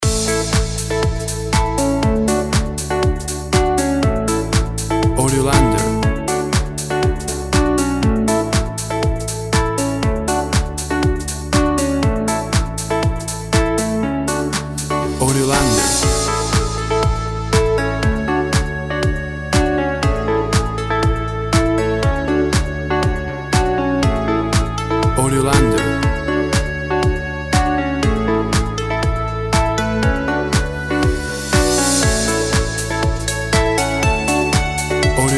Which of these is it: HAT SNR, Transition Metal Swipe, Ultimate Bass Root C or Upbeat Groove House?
Upbeat Groove House